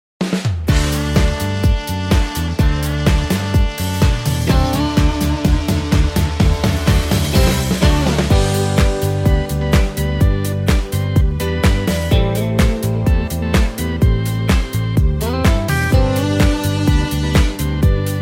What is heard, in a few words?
music